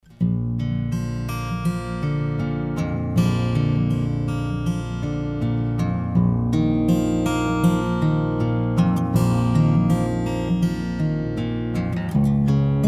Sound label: baritone